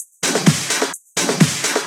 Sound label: House loops 128BPM; Vengeance Samples